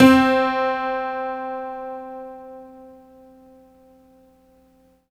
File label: HONKY C5; Orchestral; FL Keys; RS5 HonkyTonk